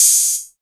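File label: hi-hat, Drum & Bass, 160 BPM; Ueberschall; Drum & Bass Flash Trackz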